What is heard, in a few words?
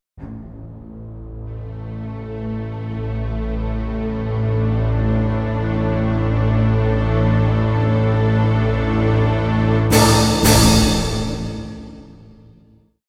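film string orchestra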